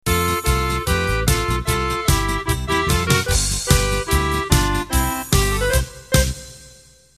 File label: Polka Bavarian end